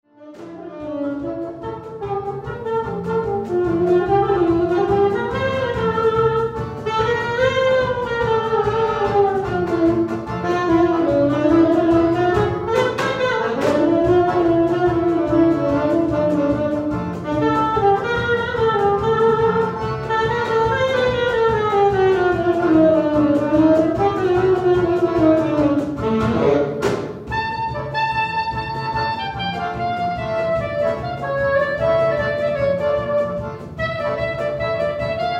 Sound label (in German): tanz Quartett